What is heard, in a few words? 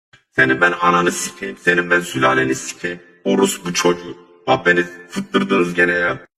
autotune